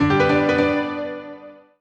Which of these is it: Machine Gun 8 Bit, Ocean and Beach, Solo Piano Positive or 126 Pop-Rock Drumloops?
Solo Piano Positive